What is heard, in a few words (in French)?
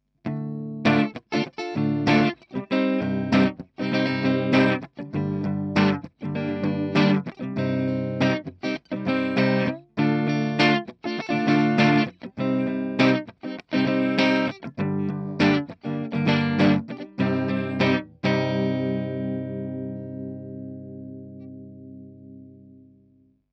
guitare electrique sans fethead